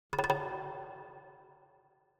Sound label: Jungle Drums Fear Fast Thin Hits; Hollywood Edge; sound designer's toolkit v2